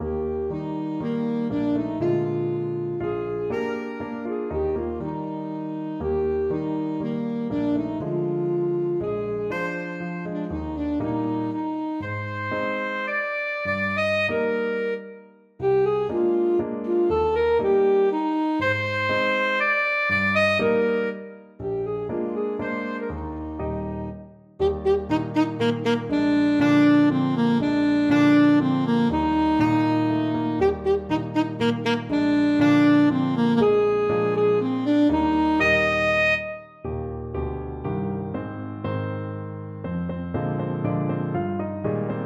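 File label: alto saxophone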